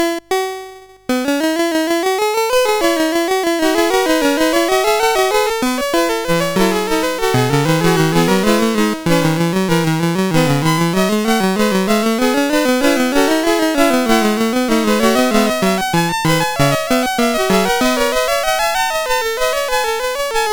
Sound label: Fugue No 2, MOS6581R2